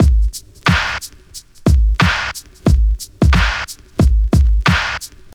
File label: 90 bpm 2000s drum beat g sharp key, Free breakbeat - kick tuned to the G# note. Loudest frequency: 856Hz; drum loops